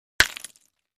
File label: Brick Hit Hammer